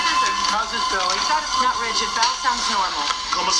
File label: bowel sound